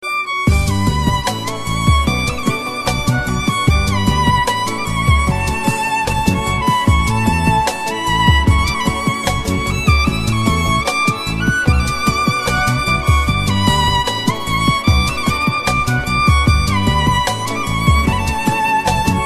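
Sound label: beautiful violin sound ringtone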